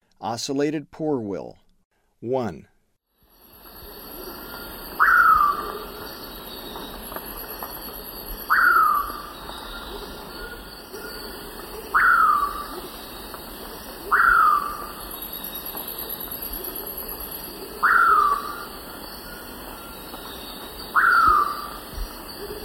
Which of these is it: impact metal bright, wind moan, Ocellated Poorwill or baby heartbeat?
Ocellated Poorwill